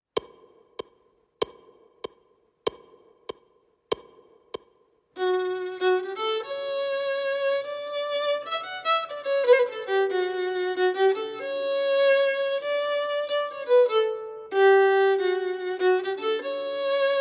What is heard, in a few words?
Harmony 96bpm, fiddle